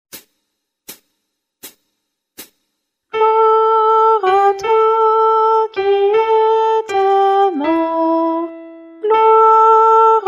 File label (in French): Messe de St Paul Anamnese Guide chant Soprane